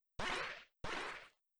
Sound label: character beam; battleblock theater